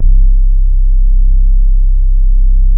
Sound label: SUPPORT BASE, BASSES; Masterbits; Vintage Voltage